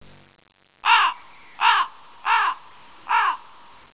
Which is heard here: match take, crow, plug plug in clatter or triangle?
crow